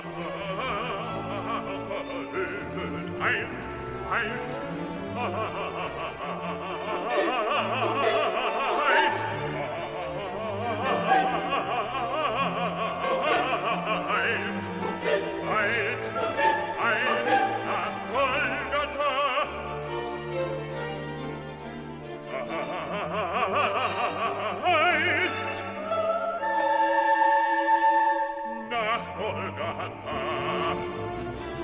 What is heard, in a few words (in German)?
SOPRAN, Stimmen mit Klavier; Johannes Passion